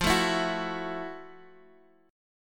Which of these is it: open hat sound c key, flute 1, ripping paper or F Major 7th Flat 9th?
F Major 7th Flat 9th